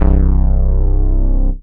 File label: Squally Bass, Brooding Bass; Instruments; Ableton; Mood Reel